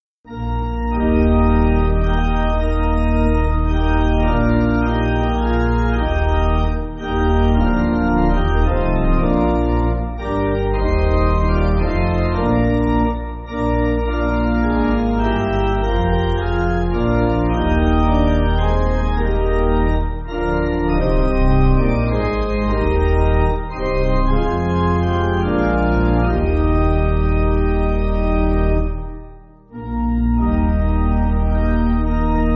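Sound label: Pipe Organ, Backing Files for Churches; My Soul Desires To Stay; Ahlstrom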